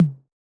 Tom, Yamaha DJX 2B

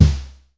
Drum X Snare; Pearl Drum-X

